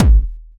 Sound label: Kick, Kickdrum; Equipped Music; Loungin House